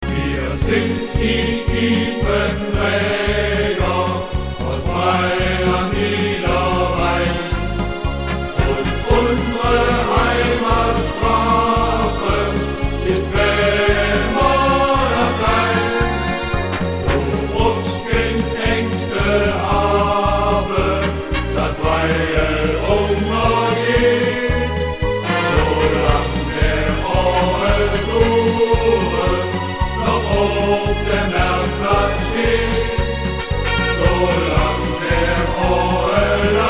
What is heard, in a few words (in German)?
Klingelton